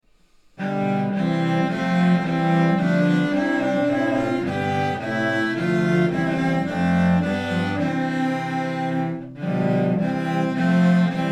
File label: Cello Ensemble; 4 cellos; FOREST GREEN